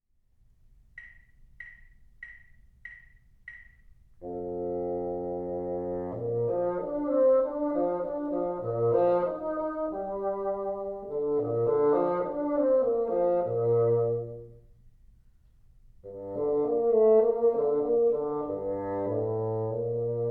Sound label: teacher, bassoon